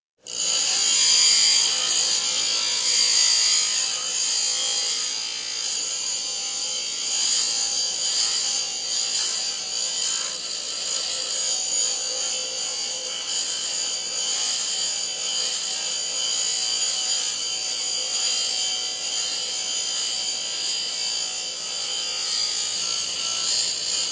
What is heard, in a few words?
Beeps; 헐리웃특수효과음; 신호-경적